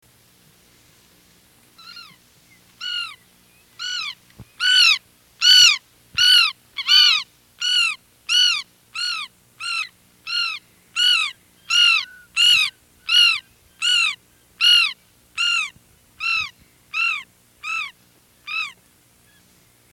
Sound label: andean gull